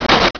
Henchman Flamethrower Scrape; Playable Tutorial Level; TR2

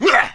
Punk Attack; Playable Tutorial Level; TR3

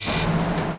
Ticket Barrier; Playable Tutorial Level; TR3

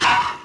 Raptor Death; Playable Tutorial Level; TR3

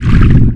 Underwater Door Open; Playable Tutorial Level

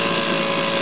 Vehicle Rubberboat Moving; Playable Tutorial Level; TR3

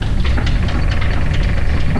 Cog Puzzle; Playable Tutorial Level; TR1

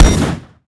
Sas Machine Gun Fire; Playable Tutorial Level

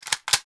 Reload; Lara

